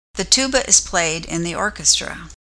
tuba

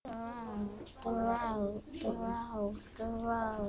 throw

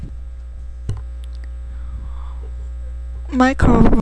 microwave

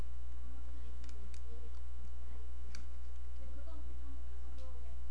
throw

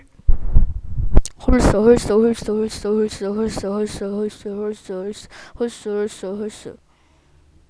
horse

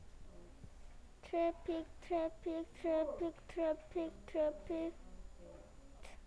traffic

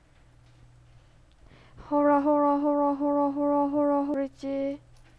horror

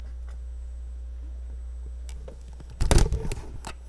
car

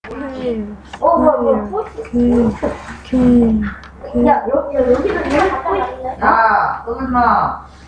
quail

